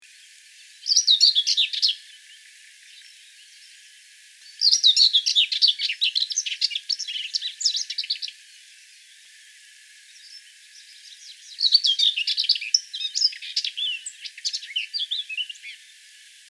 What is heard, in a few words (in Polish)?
cierniówka śpiew samca